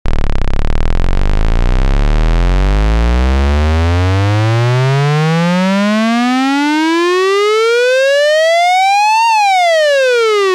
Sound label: LFO; Treadstone